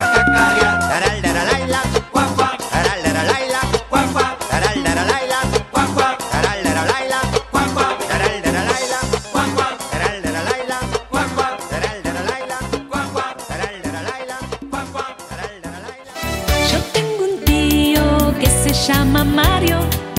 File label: la la la kids cartoon ringtone